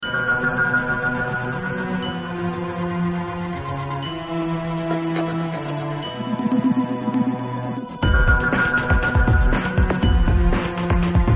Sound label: Suspense - Demon Lair